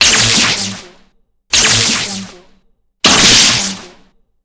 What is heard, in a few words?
electric zap